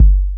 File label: kick low deep sub; Classic Chicago House Drum Hits